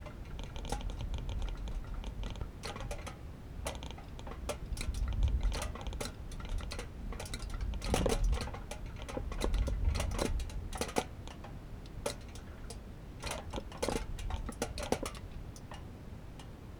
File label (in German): Wind - Alu; 4 AKG stereo; Curacao; Fieldrecordings